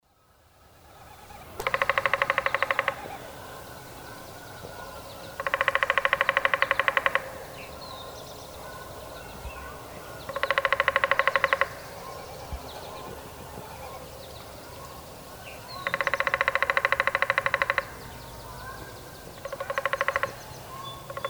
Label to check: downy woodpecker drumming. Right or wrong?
Right